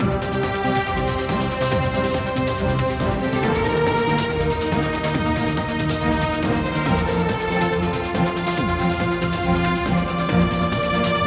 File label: Battle To Win loop